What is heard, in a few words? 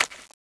zombie ghost footstep; ghostfury